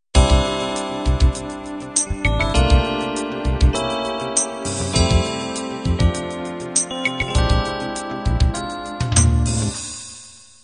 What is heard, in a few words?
slow ballad intro